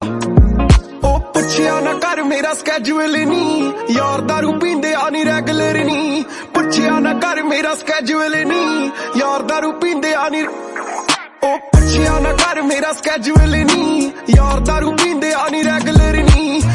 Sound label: Punjabi Song